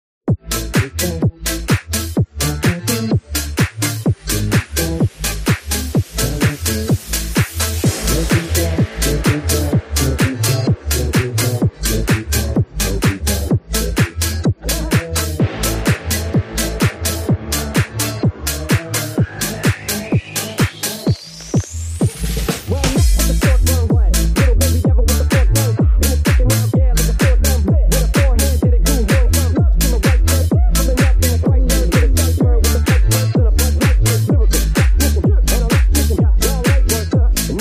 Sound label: song; space game